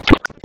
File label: Short Sword Impact Flesh; Weapon Impacts; Swords; Dendrit Short Sword; Combat